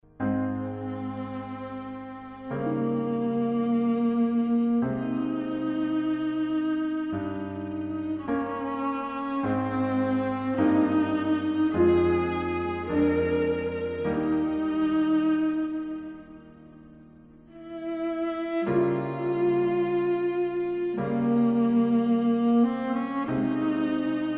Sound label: Sonata; CLASSICAL RELAXATION